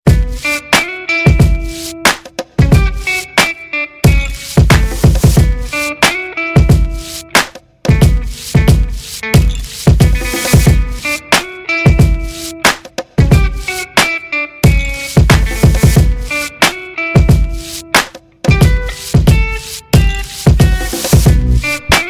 beats

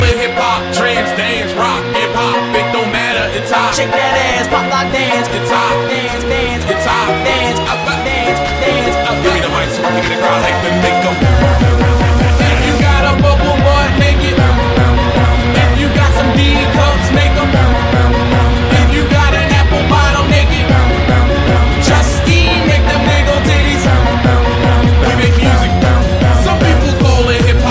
tetris hip hop remix

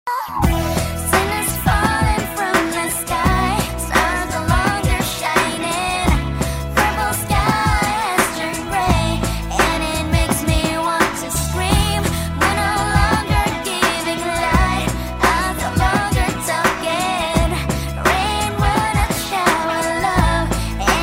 scream

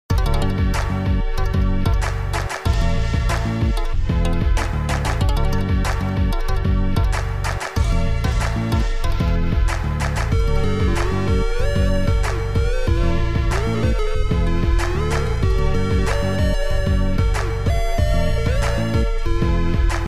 keygen music

